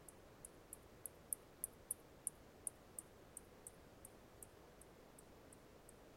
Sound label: bat